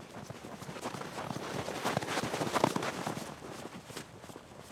snow run; anemoi

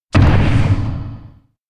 bike land; anemoi

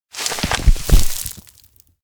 harvest veggies; anemoi

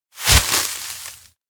bouquet throw; anemoi

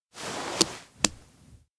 pat belly; makoto; anemoi

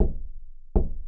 knock

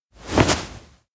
hold upperarm; anemoi

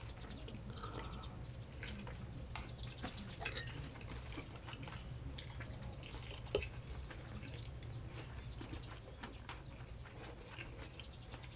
indoor janitor room breakfast two; LOOP; anemoi

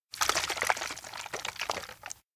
shaker shake; anemoi

